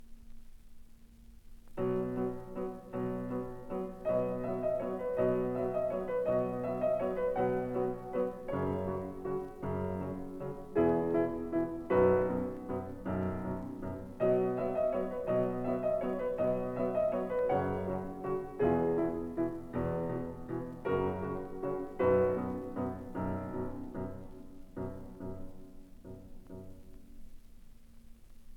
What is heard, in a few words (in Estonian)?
Improvisatsioonid